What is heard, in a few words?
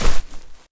slide floor